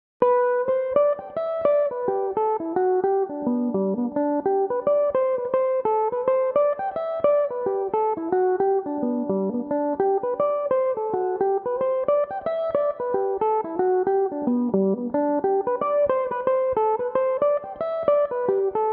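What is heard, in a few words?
g major, mandolin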